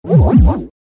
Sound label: noise pulse